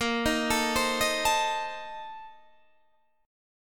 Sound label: A sharp Major 7th Suspended 2nd Suspended 4th A sharp Guitar Open D